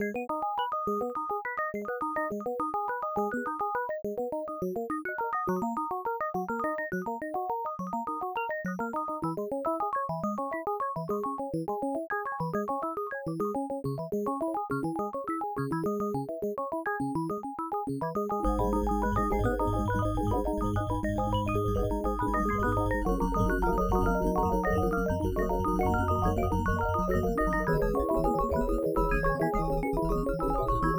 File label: 8bit